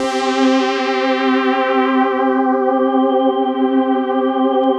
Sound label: SYN K-2000; Sound & Vision - Gigapack I CD 2